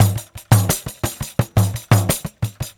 Pandeiro, Perc Loops; Drum Loops; 4-4; Sonic Foundry; Drum Tools

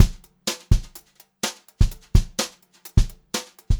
Brushed Drum Loops, JAZZY; USB Soundscan vol.39; AKAI; 90 s Sample CDs; 126 JAZZY

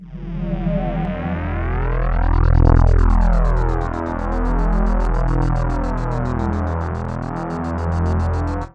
FLT SWP, synth; AMG; Global Trance Mission VOL-2

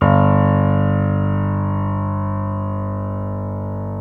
PNO Grand Pno; Club-50 - Foundations Roland

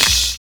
LIVE CLAP; Univers Sons

